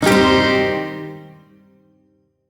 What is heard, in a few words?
Shawzin Pent Min Chord B; Music; Warframe; Duviri; Chords